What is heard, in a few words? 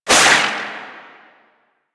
Void Projectile; Duviri; Incarnon Adapters